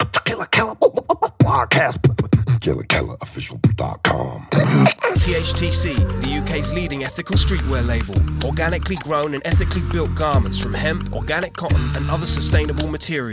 beatbox, italian beatbox champion

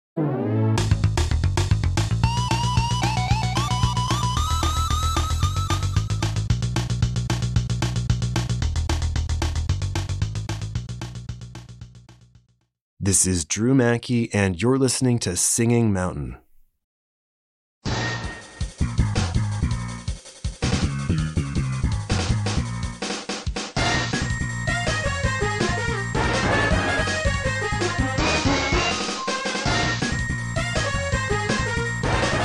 hard rock minus guitars

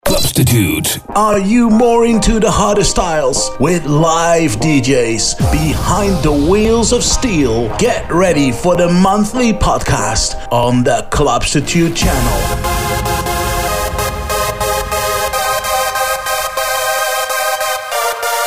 dance radio promo hardstyle

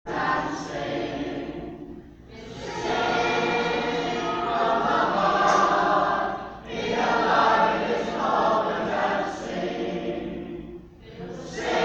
choir; national elf service; solent

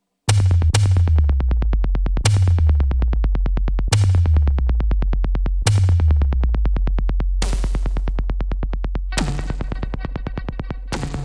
techno live sets, strong techno set

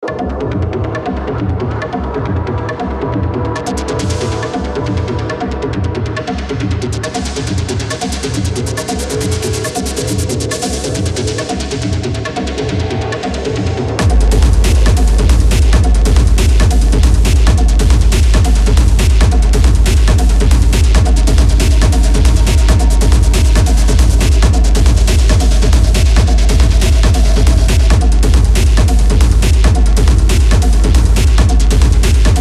future minimal techno

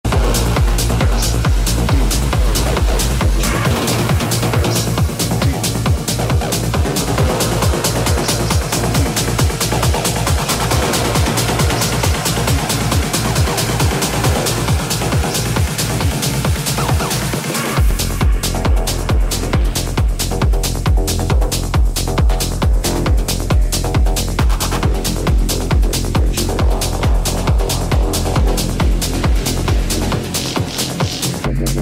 peak time techno set; tanzhaus west

